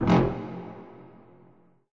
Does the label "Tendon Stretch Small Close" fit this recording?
No